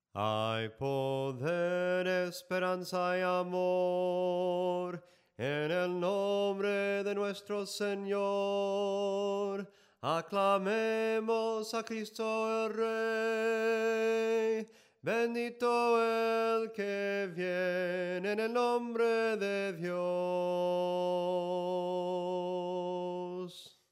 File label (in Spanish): SOPRANO CANTADO, himnos en melodia soprano